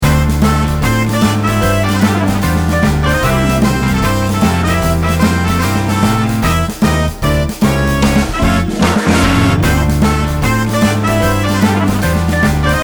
JAZZ SONG

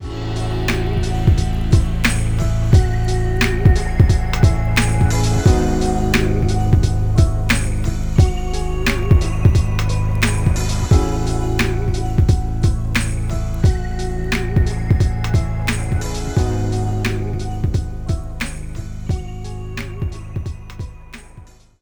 Ambient Music; 千太

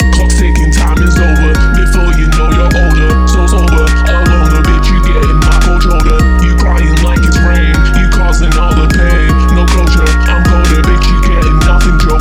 kawaii beats

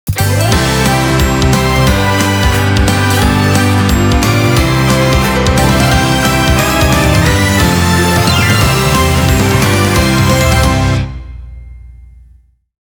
Happy Birthday to Me

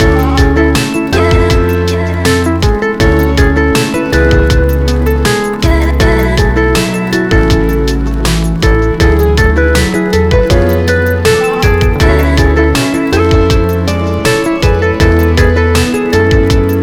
Calm and Peaceful

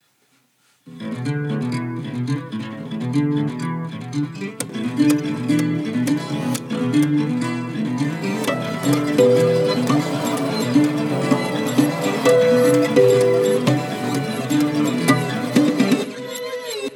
Digital Acoustic; ユクロス

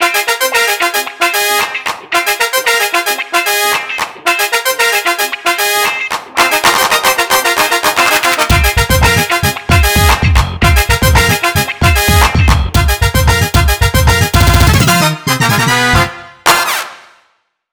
Fanfare and clapping

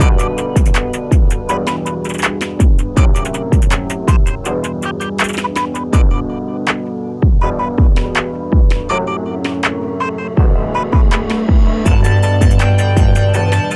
Hyperpop

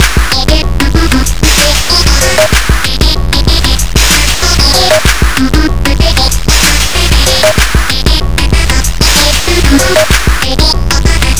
Corporate

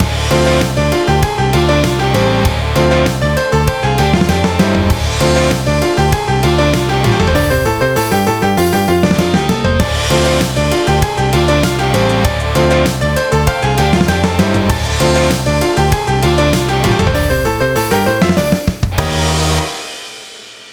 Chip Rock

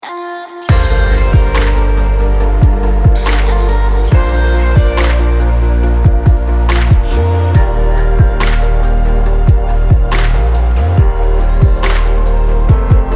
CHILLSTEP

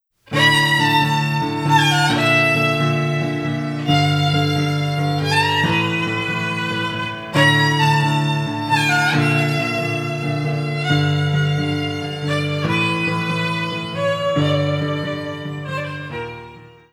Violin; 工場地帯午前0時

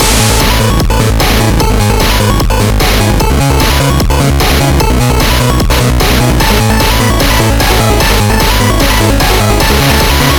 8bit GB; Xinon

